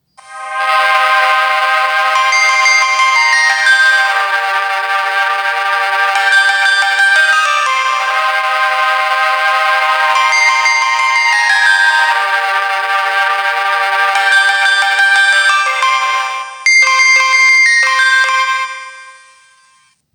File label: Ambient Mood, ringtones